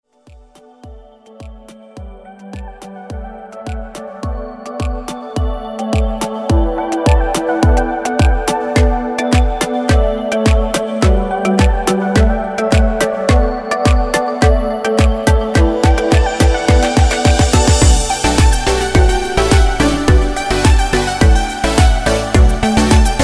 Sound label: rhythm beginner; lv 1 type5